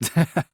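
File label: tch haha, male; rantaro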